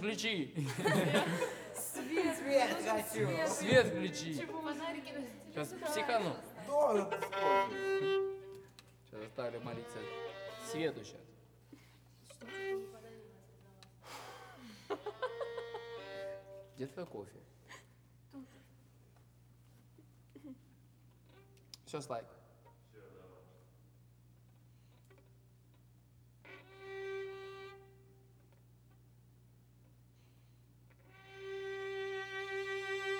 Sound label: Overhead